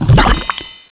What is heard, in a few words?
change form mud; Bounce Touch